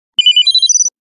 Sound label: Ring